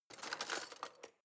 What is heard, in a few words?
knife wood drawer grab